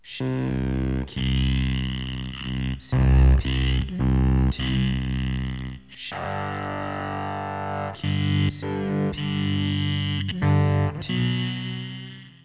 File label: Cello Speak, hipercello